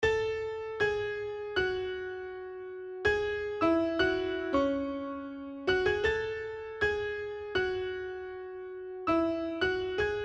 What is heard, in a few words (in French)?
piano